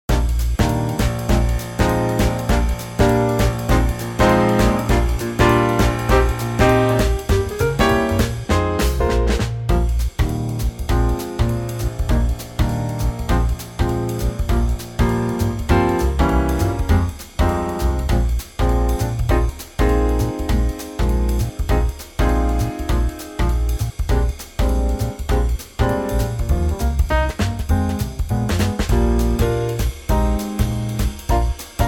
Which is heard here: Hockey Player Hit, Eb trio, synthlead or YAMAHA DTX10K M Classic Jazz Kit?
Eb trio